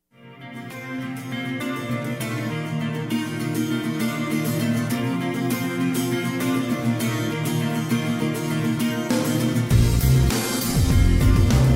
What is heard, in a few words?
Back Again Instrumental Music Only Remix